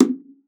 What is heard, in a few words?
Bongos; ProSamples vol.42 - Session Instruments